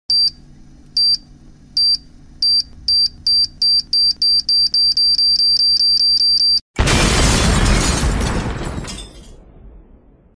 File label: Time Bomb